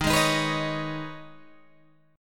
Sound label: Eb 7th Suspended 4th Sharp 5th, Guitar Open G